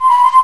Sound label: Bottles